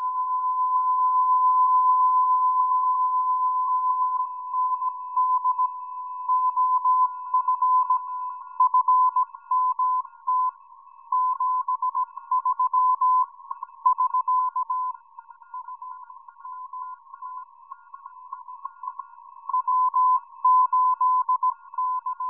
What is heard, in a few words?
40m snip sharp filter